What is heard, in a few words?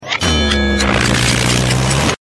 time stop; reverse